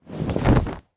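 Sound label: free spin banner drop; netent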